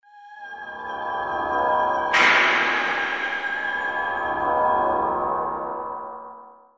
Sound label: Horror